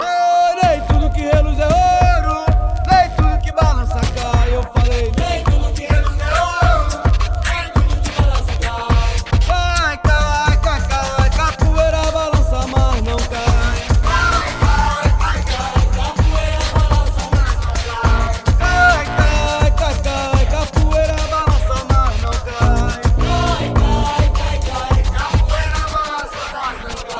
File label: capoeira; Latin